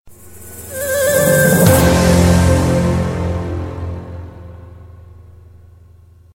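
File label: funny SMS melody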